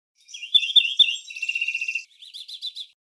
primaveras, verderon

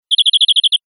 cortas, verderon

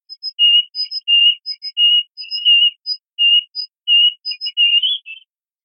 primavera carbonero herrerillo

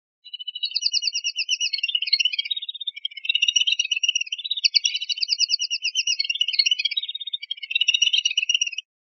rep cernicaleos, pardillo; linked files test pardillo

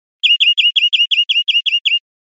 chones corridos finos, verderon

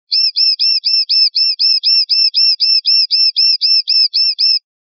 titeos

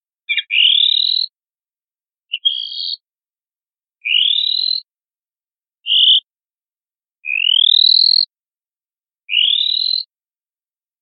tatarrui rui chorrit, pardillo

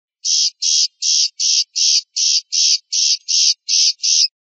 rep rabieta achillonada, pardillo; linked files test pardillo

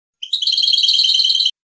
cascabeleo basto, campero

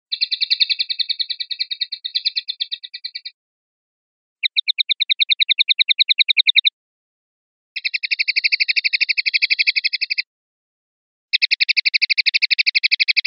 sonajas, pardillo

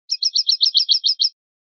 pitadas, pardillo

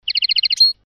currupin, campero

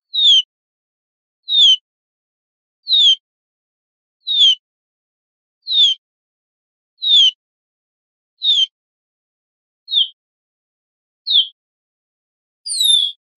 quejas cio; verderon

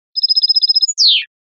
terminacion timbrada

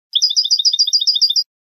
bibleos acascabelado, campero

